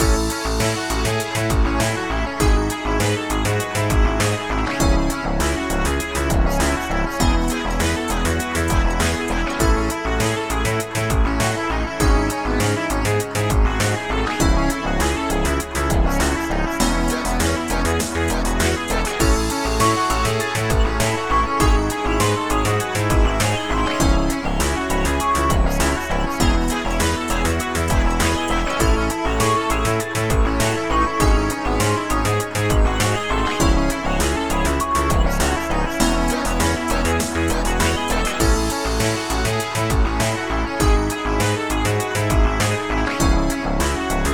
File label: game over